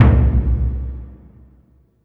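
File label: Burundi drum, Heart of Africa; Spectrasonics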